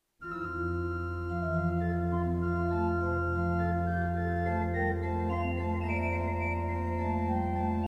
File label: organ